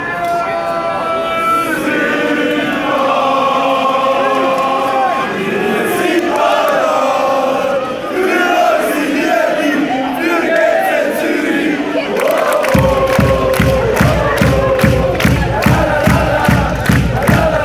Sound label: woah woah lalalala, fan chants; grasshoppers